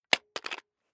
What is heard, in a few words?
Plastic Cased Tape Measure Dropped On Floor Impact Bounces; Hand Tool Sound Effects